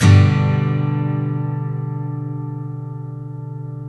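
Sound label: steel G major down, CHORDS STEEL, Guitars; Spectrasonics; Hans Zimmer Guitars VOL-1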